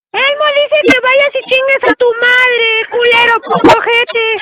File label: Dice; Elmo